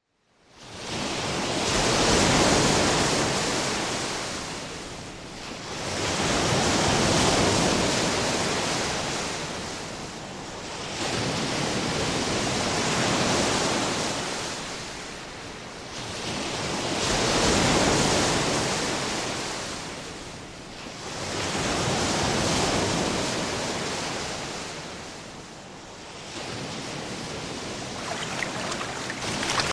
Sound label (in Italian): suono mare